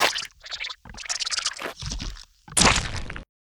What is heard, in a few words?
seedmon death, monster